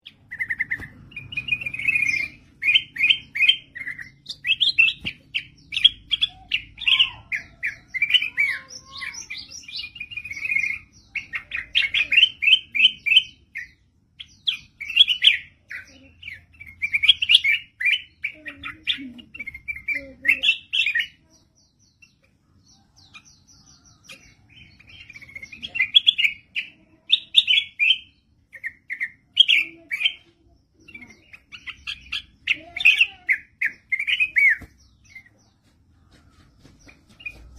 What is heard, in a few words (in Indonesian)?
suara kutilang mas; burung kutilang